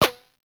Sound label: Weapon Hit Effect Metal